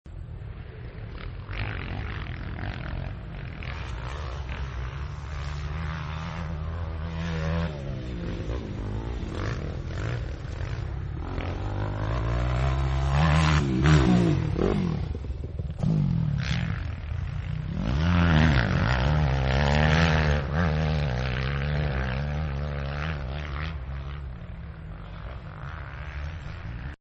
MotoX Over Jump, Motorcycles; Vehicles; Partners In Rhyme

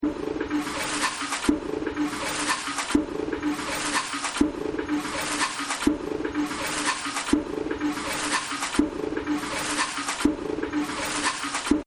Factory; Factory 17

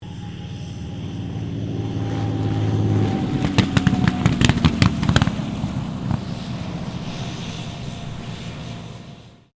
AIRCRAFT CA-18 MUSTANG LAND AIRCRAFT IDLE; P51 /CAC-18 Mustang; Airplanes (Sound Effects:Vehicles)

